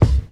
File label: raw kickdrum c key